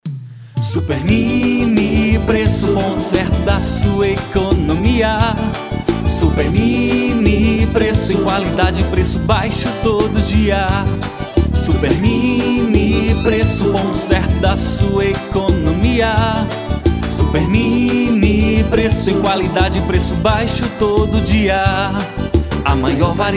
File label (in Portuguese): jingle